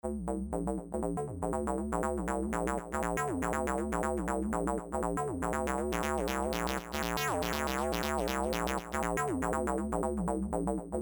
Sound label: Noise